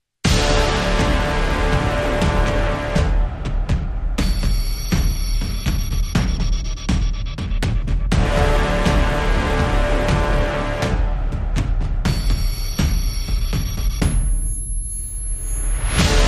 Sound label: Instrumental No Vocals